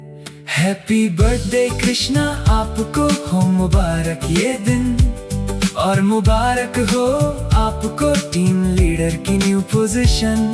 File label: Promotion Song; corporate